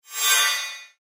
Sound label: Sci Fi Menu Screen Digital Whoosh; Control Panel